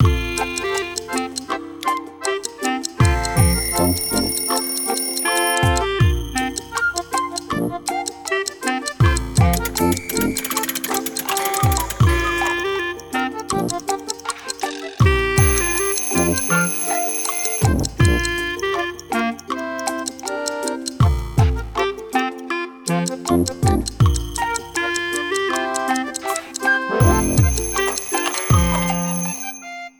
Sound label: Clock Shop; Album by a local Atlanta band